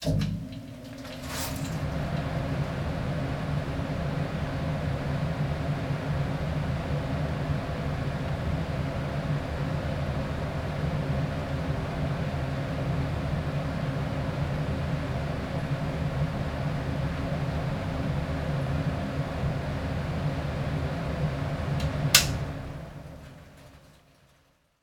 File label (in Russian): Kondiccioner